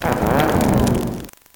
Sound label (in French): Cri